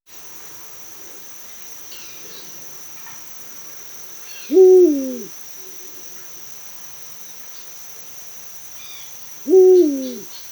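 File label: eagle owl hooting at dusk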